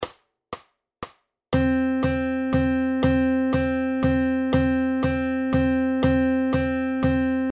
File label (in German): Viertel Noten 34, Quarter Notes in 3/4 Time